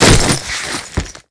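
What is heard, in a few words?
destruction luggage burst; Explosions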